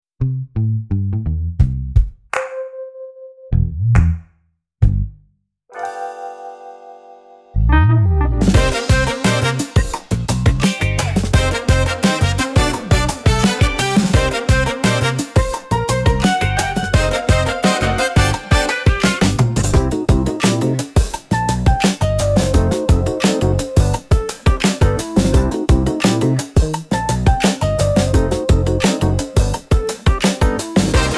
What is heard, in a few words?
Jam Cat, rnb